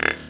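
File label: percussion FX, Dance Drums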